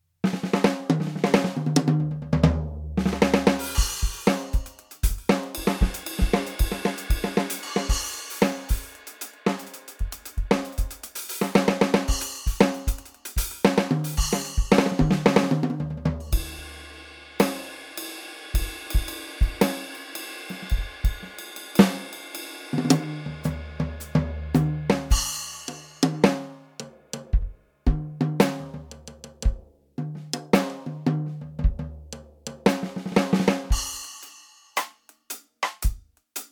EFNOTE Mini 3 Vintage Collection Kit

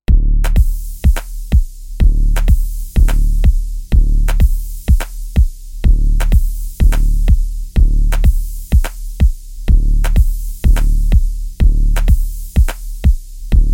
EDM; KI MIDI Generatoren AIVA

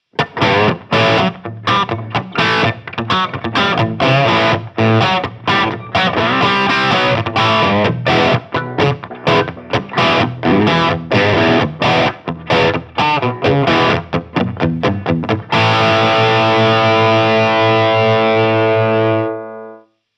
Schecter SLS Elite C 7 Crunch Vintage

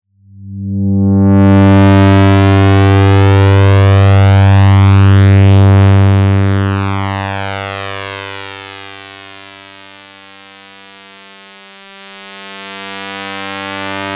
HighPass Filter; Model15

